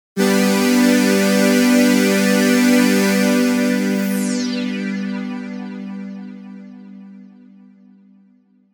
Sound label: Sweep Strings; j106